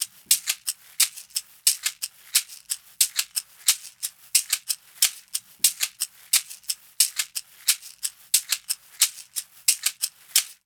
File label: percussion shaker loop 90bpm A-2; flamingo